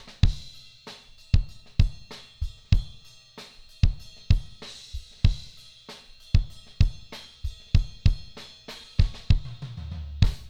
Kick Without Debleed Long Tail Kick

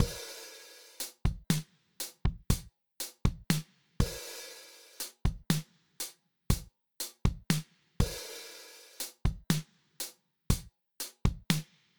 Lowenhance on Kick Off

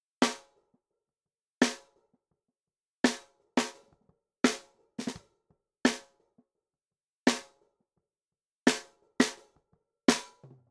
debleed snare ON

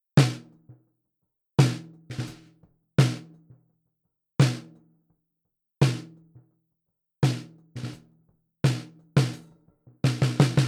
debleed snare ON

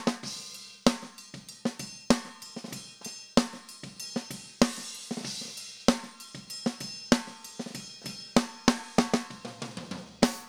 Snare Without Debleed Ring Through Snare

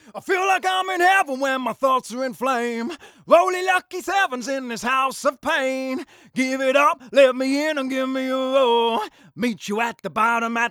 Compactor Vocals Off, Lead Vox

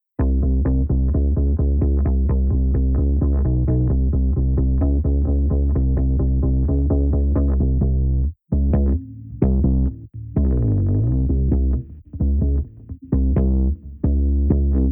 Lowenhance on Bass On

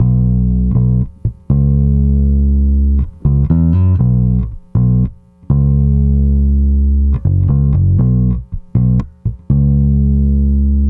Lowenhance on Bass On

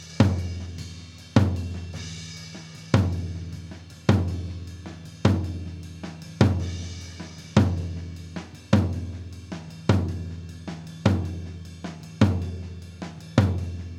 Toms High Debleed Long Tail OFF

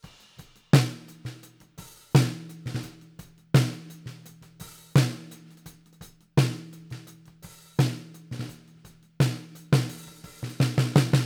debleed snare OFF

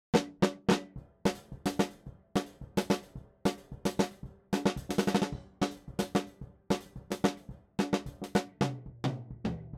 Snare loud cymbals deBleed On

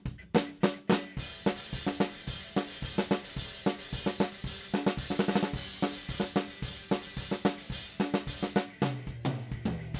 Snare loud cymbals deBleed Off

